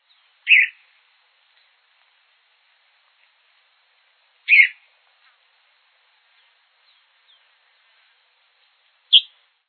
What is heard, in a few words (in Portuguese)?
sabia-do-campo